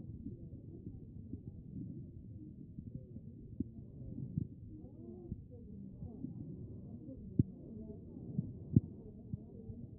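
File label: anterior chest, position 4; B MODE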